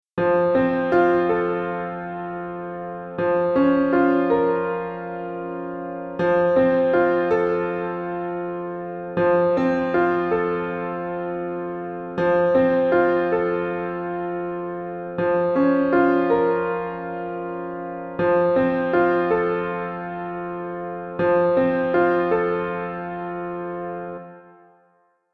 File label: piano; FFVII; tifa